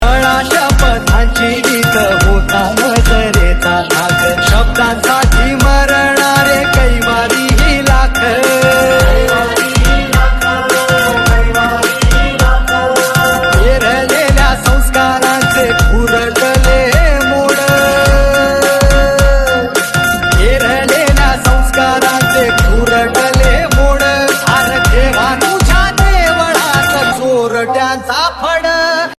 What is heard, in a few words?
ringtone